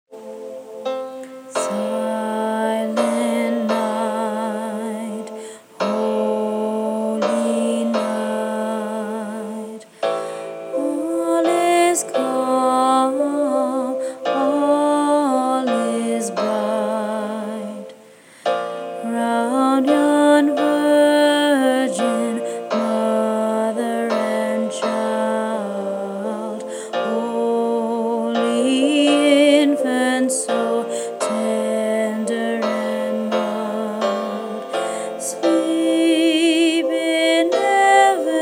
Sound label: silent night tenor